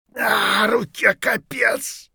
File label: bear3 broke hand; Escape from Tarkov